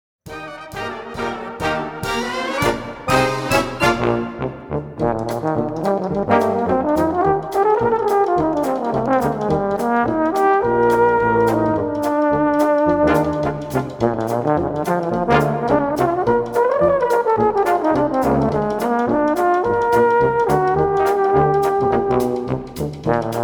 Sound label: Egerlaender Tenorhorn Rag